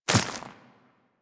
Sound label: Infested Small Walk Dirt, Footsteps; Warframe